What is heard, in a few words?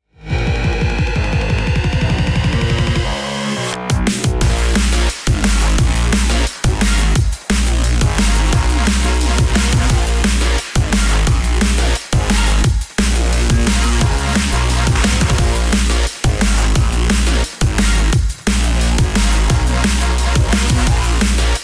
polyphonic